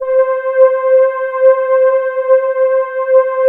SILENT C6, STRING; Q Up Arts; Dream Experience

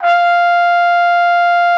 F5, TRUMPET; Xsample VOL-3

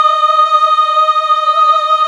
GOSP. AAAHH

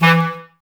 wind; Sample CDs; Miroslav Vitous; Woodwind Brass Ensemble; Wood Brass 2

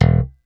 E0 SHORT, STIK BASS; D-STIK BASS2

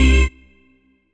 HOUSE, House Dance

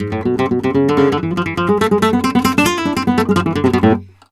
Flamenco Guitar Picado, a; Best Service ProSamples vol.46 - Flamenco

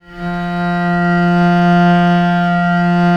VL F2, VIOLE, Historical Instruments; USB Soundscan vol.23

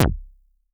K Juicy, Vermona Mono15 Drums Kicks

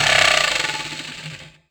Synth Perc; Dream Zone

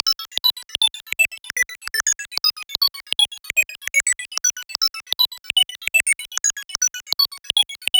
Synth Arpeggio, Synths; Eclectic Electronica

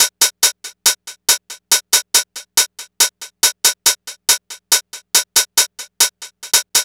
NRG Cymbal

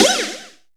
Shock Snares, Percussion

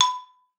XYLOPHONE B4; Xsample VOL-4

